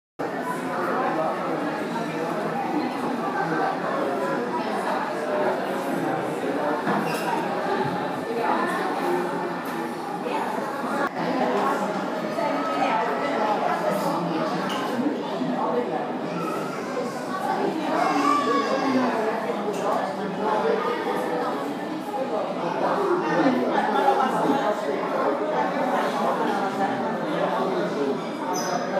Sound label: Coffee Shop Ambience